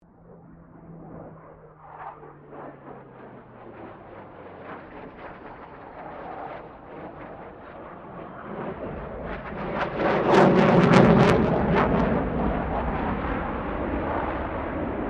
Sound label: 1997 BAE HAWK 127 PASS LONG; Airplanes; Vehicles